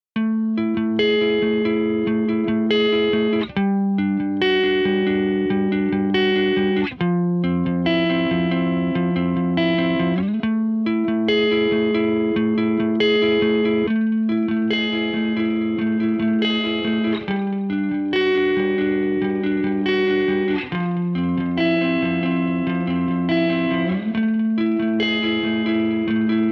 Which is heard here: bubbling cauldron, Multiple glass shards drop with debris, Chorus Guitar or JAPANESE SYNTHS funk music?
Chorus Guitar